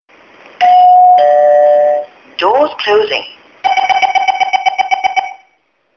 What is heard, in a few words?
Singapore Mrt Door